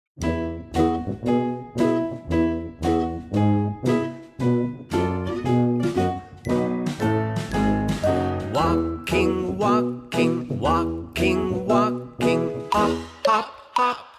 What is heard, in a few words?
walking hop song